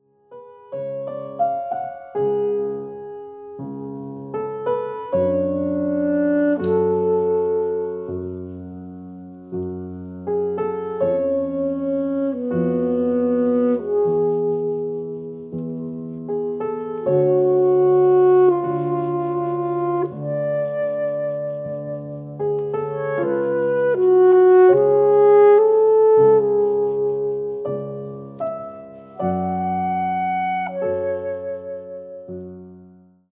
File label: sax, musique